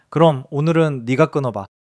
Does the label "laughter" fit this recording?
Yes